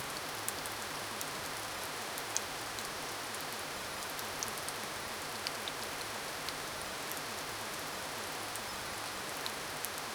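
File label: gentle light rain; scottish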